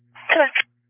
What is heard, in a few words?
police